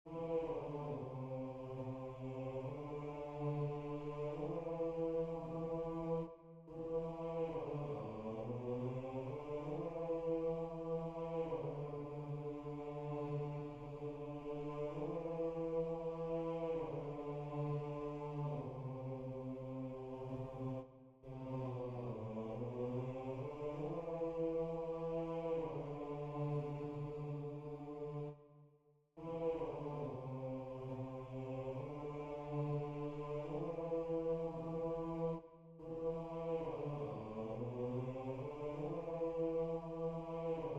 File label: Bass; Wielki Czwartek; spiew na triduum